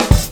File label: Fill; Mo' Phatt; Digital Sound Factory Mo' Phatt